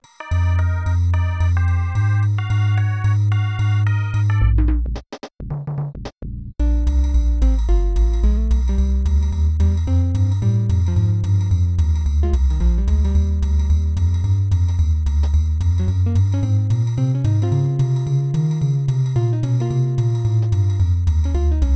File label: Jazz; Animal Crossing Music Extension Revived; kk; aircheck